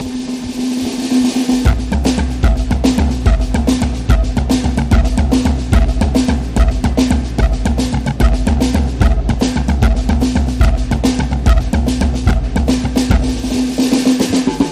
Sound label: Hangman Phase Drums Only